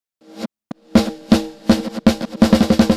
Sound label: Reverse Snare